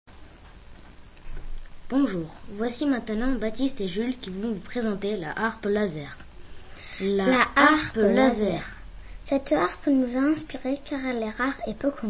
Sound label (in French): Harpe laser